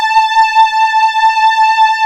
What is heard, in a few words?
high str pad; Best Service Gigapack 1